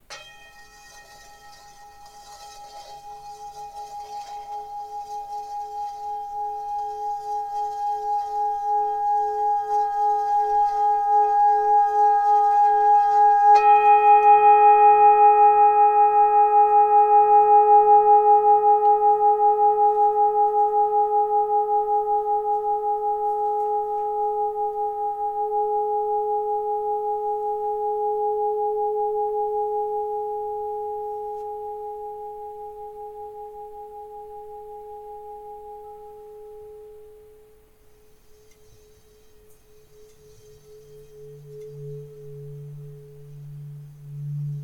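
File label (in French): bol bengale 1.3-1.4kg sol dièse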